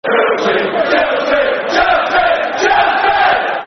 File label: Chelsea, Chelsea, Football Chants (Soccer Song)